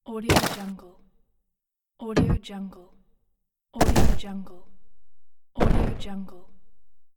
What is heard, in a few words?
percussive gamefx; productionelements